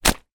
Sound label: wet towel drop on ground; foley; zapsplat